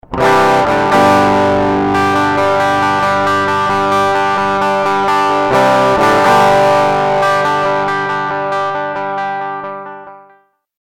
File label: treble gain clean strumm; pale horse transparent overdrive; warmth dev